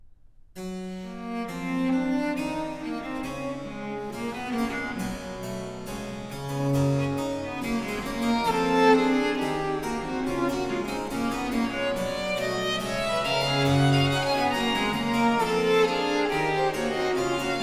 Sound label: harpsichord solo; XIV Canons on the Goldberg ground, BWV 1087; XI. Canon duplex übers Fundam; Bach; Musikalisches Opfer BWV 1079